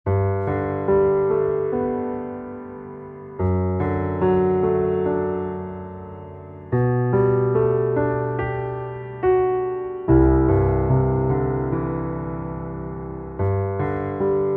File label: Underscore PIANO; Worship Flow